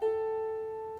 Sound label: celtic harp